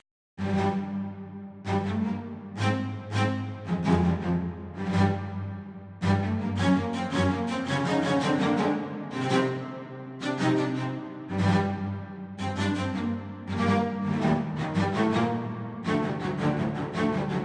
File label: Cello; Game Music